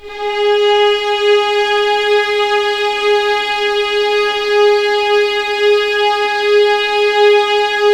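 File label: G#4 legato violins; East West; The Ultimate String Collection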